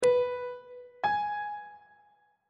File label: relative minor of b major is g sharp on treble clef